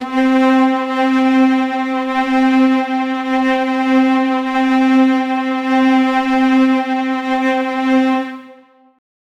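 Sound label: epic cinematic staccato strings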